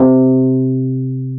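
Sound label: Fender key bass C3; Sonic Reality; Vintage Timetraveler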